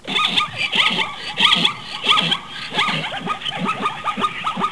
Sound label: zebra; zoo